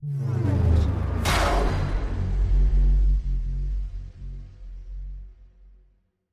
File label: Bass Drop; SoundsCrate